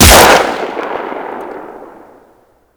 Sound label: Drone Machinegun Fire; Vay Hek